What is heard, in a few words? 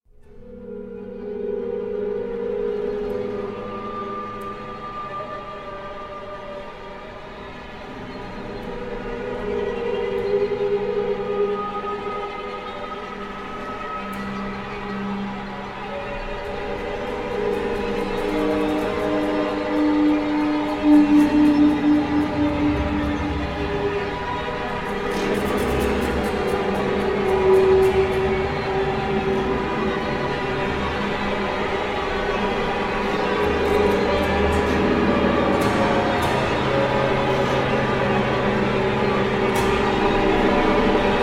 Crash